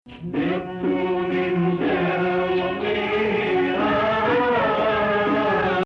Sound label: Rast Sihtu Nahawand; Maqam Rast